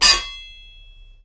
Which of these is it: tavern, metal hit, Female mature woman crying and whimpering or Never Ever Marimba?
metal hit